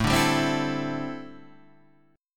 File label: D 7th Suspended 2nd Suspended 4th A